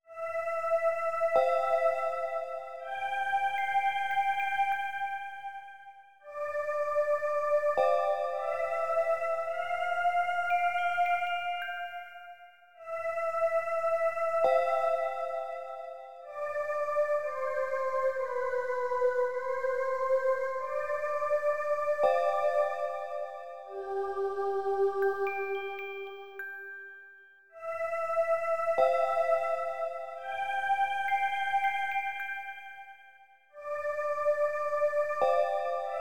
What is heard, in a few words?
BGM FIELD SEA